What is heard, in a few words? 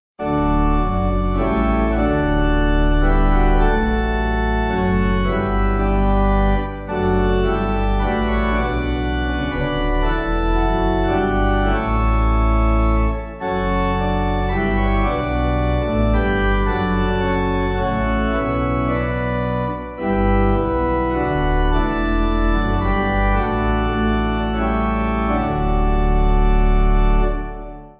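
pipe organ hymn; When He Was Baptized; Charlestown 8.7.8.7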